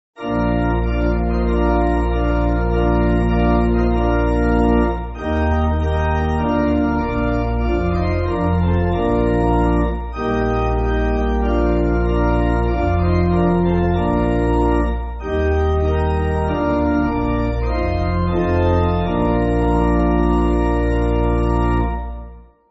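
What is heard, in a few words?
Pipe Organ, church backing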